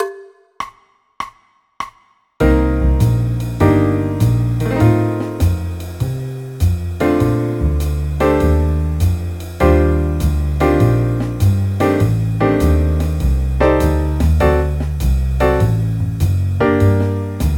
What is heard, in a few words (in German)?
g moll akkordverbindungen